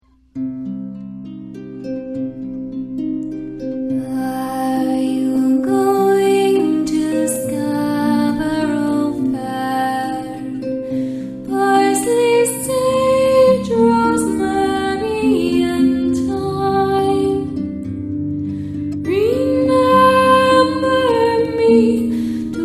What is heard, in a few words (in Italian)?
ARPA CELTICA